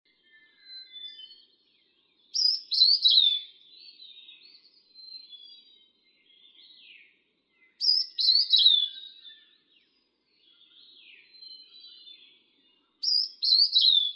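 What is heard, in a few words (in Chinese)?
黃胸藪眉, Yushan National Park; 藪鳥、黃痣藪眉; 噪眉科/留鳥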